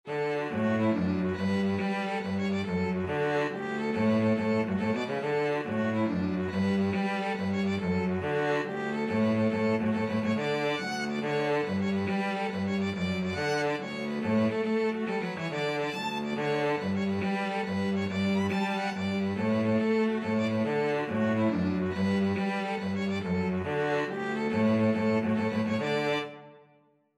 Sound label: violin cello duet